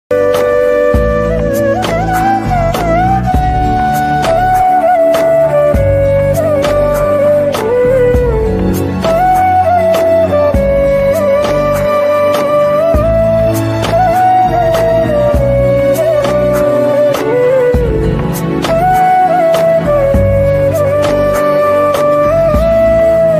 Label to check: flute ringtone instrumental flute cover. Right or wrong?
Right